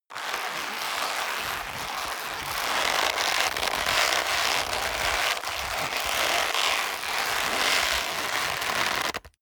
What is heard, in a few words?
leather creak very long hard